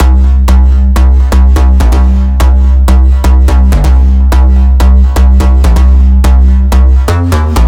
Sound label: Big EDM Bass Loop C1; Future House; Future House Tools; Bass Loops